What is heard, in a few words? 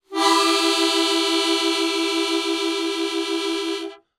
musical harmonica single